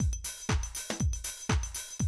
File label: Classic Drum Loops